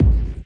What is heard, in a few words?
heavy techno kick; kick drum samples